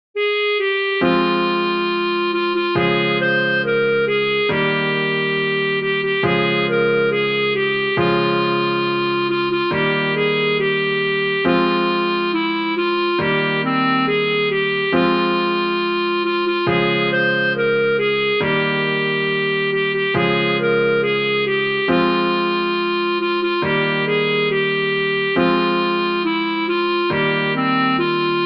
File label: B flat clarinet; 仍然樂意歌唱頌我主